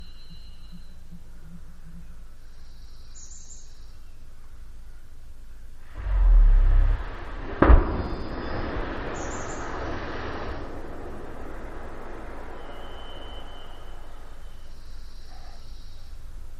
Humpback Whale Vocalizations and Tail Slaps With Sooty Grouse; Sooty Grouse Chorus; Alaska; MAMMALS